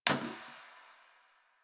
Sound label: Janitor Plastic Spray Bottle; Warframe; Ambience; Nineteen Ninety Nine